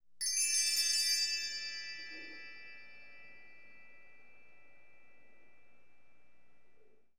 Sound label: Bell Tree; Sound Design - Fantasy; Sony Pictures Sound Effects Series Volumes 1-10; Sony Creative Software